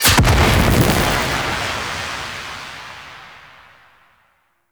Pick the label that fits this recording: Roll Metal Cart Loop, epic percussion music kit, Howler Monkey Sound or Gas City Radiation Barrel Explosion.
Gas City Radiation Barrel Explosion